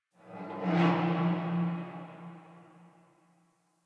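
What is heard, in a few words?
Distant Metal Garbage Wronk; Ambience; Warframe; Nineteen Ninety Nine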